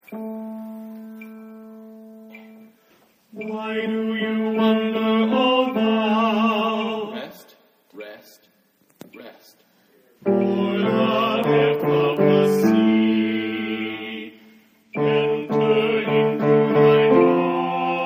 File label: Why Do You Wonder Tenor Bass